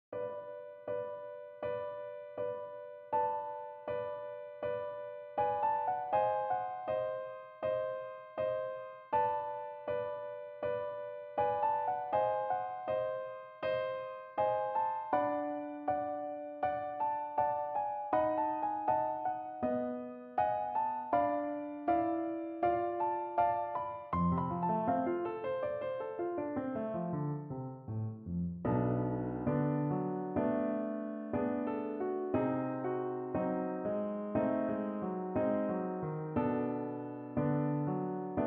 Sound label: piano